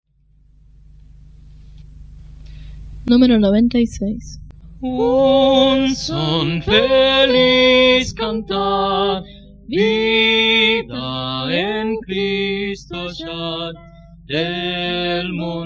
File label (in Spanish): Coro, himnario niños